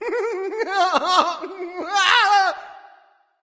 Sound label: laugh; smite; sobek